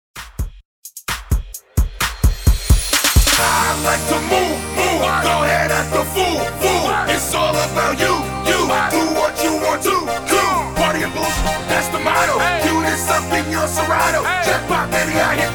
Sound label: XMAS 130 BPM